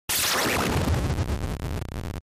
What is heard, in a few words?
Distort Hit Elongate, distortion